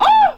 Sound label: "oh"